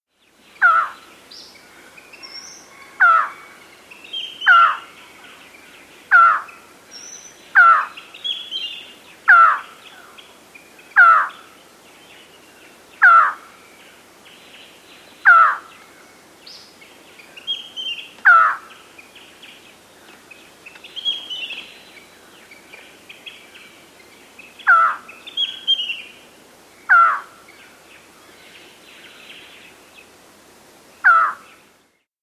Black-Backed Antshrike, Nature's Songs, S. America